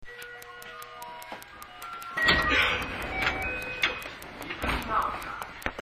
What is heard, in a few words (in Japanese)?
ドア開け, 205系体質改善車; 東日本では既に引退の車両。